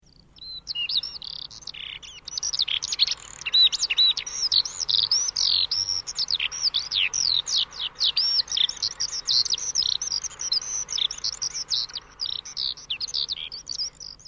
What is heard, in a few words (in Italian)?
allodola; faunistico